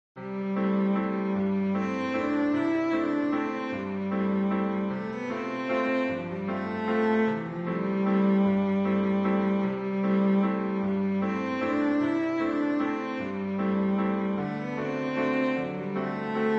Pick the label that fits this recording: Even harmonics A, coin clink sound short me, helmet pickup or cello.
cello